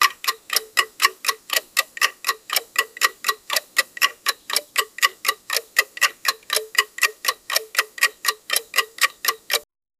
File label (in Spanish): sonido de reloj marcando; timekeeping